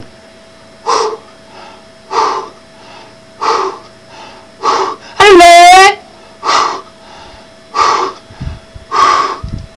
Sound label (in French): souffle